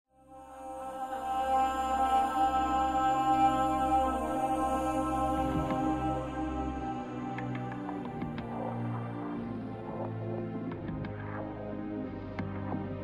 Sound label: song